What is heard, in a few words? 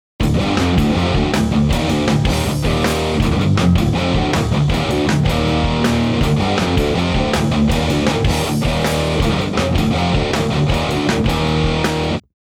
High Gain